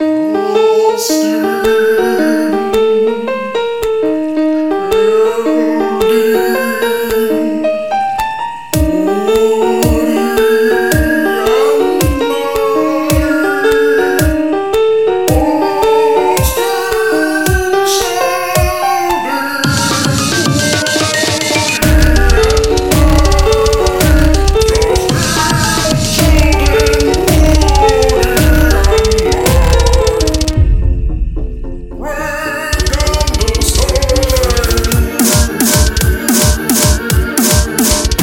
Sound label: lolicore; KyokudoCore Records